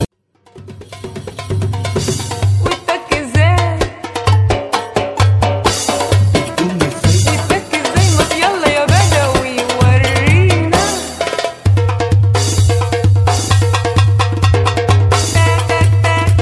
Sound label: tabla solo